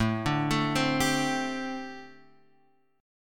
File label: D 7th Suspended 4th, chord